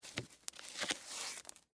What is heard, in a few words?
Tennis Shoes Untie, Foley, Tennis, Shoes, Untie, Laces; Shoe Sound Effects; Shoes (Sound Effects:Objects); BlastWave